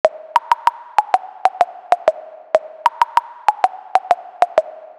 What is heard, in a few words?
High Loop